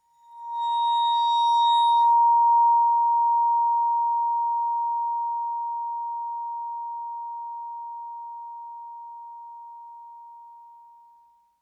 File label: Vibraphone bow Bb5 stereo; MIS Pitches - 2014; Percussion